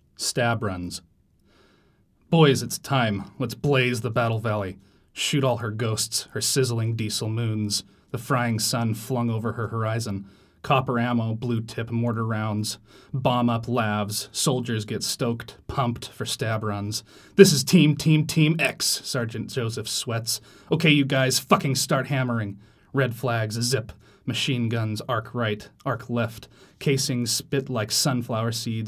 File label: stab runs